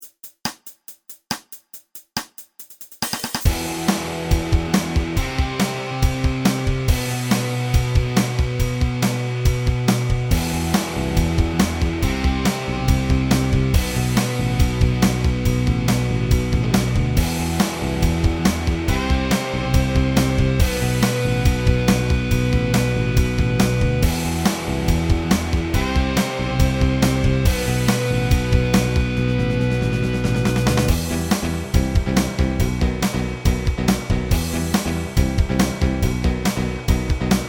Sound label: Blues Rock, Backing Tracks; EasySoloing